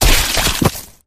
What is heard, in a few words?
flesh destroyed medium; flood